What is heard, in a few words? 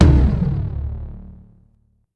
Taiko Mid; smite; raijin